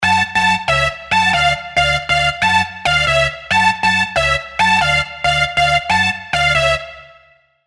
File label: rave stabs; revealed; Spire